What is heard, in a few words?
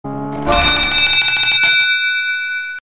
Slot Bonus Text